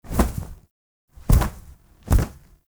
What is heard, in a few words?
Owl Finisher Stop Success; Warframe; Duviri; Animals